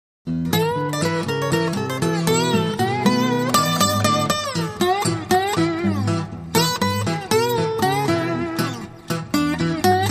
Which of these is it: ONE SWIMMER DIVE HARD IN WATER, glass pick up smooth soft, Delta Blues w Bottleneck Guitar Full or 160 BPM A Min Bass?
Delta Blues w Bottleneck Guitar Full